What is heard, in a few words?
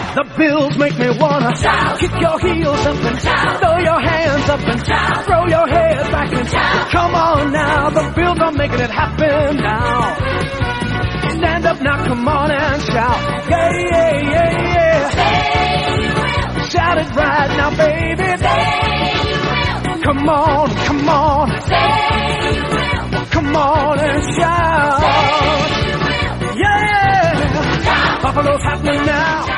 song; buffalo bills